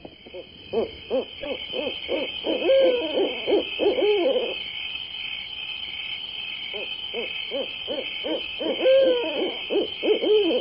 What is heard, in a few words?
Owls, bird call